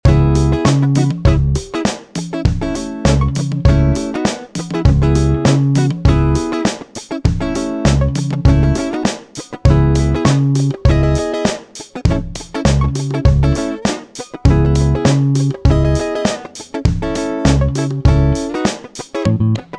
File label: Glitter Disco Starters; Multiton Bits